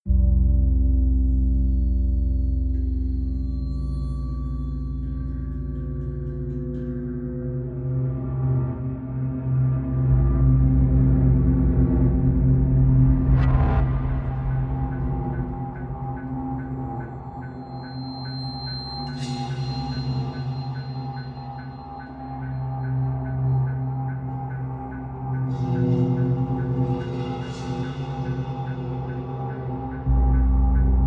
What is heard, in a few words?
pads and pulses